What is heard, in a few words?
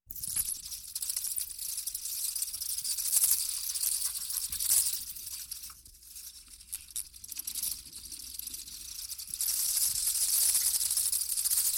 Mice and Rats Squeak